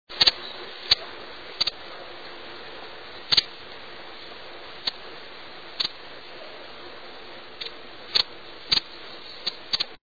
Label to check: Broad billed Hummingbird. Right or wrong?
Right